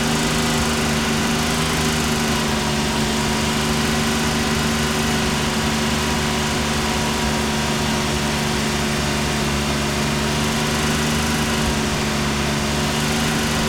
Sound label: Porsche 911T Loop; Automobiles